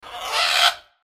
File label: Ara